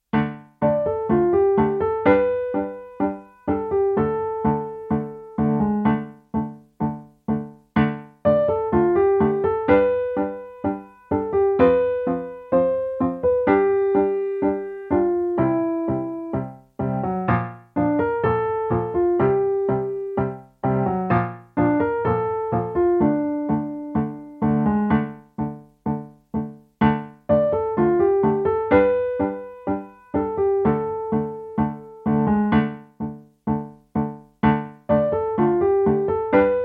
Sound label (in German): Ritterlicher Tango, Endtempo